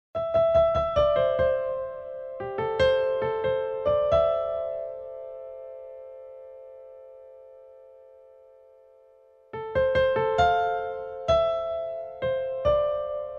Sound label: rain piano version; Bubblegum Crisis Tokyo 2040 02 Collectors File